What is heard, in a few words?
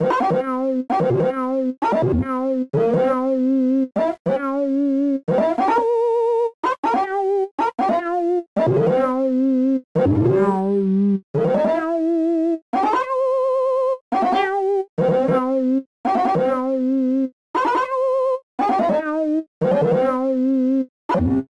Salsa